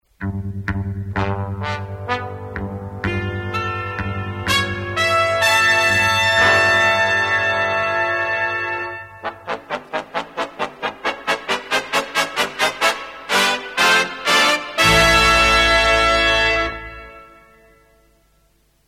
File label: Jingle; Mercator